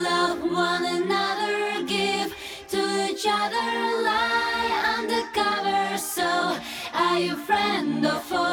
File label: vocal loop, acapellas